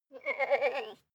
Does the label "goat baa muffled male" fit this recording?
Yes